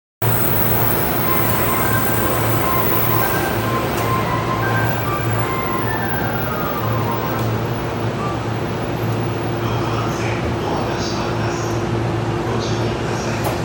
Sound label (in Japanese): JR埼京線武蔵浦和北, train sounds